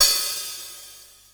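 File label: XE8 open hi-hat; Crypton - DrumBase5000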